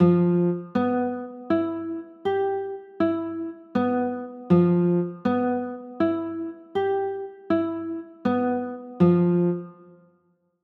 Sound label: g 13, chords